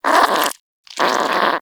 Vocal Snore, Hunting Critters; Nexifera

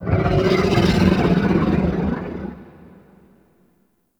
Move; Golden Maw; Warframe; The War Within

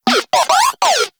Moa Compassionate Vocal Greeting; Pets; Warframe

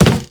Walk Wood, Footsteps; Operator

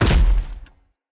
Step Default, Footsteps; Enemy; Nimrod

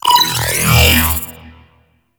Minigame Success; Corpus

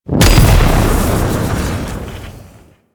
Pipe Valve Explosion; Ambience; Warframe; Corpus Gas City; Gameplay Remaster

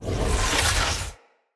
Casting Device Charge Heavy Start; Weapons; Player

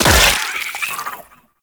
Loot Crate Cyst Burst; Ambience; Gameplay; Warframe; Infested Eris

